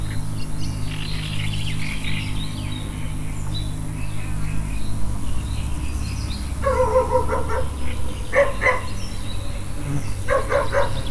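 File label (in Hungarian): kutya és hangos rovar is jobbra röccsö; Tiszababolna; termeszetben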